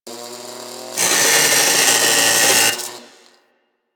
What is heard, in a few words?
Worker Grinder Idle, weapons; Grn Worker Grinder